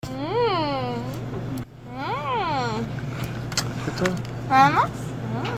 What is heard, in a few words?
mmmm mmm